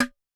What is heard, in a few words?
JNGL SN, Snare; Yamaha DTXpress-II